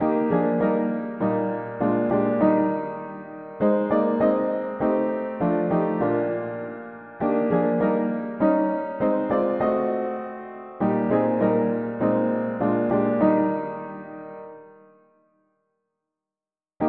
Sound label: mono piano; hymns